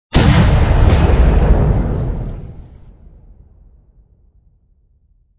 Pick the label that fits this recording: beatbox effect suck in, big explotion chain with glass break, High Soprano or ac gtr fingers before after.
big explotion chain with glass break